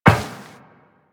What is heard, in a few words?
Step Metal Perforated, Footsteps Walk; DE; Warframe; Tenno